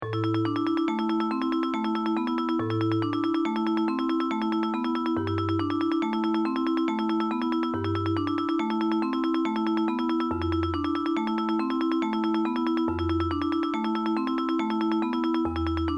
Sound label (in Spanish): estudios basicos tremolo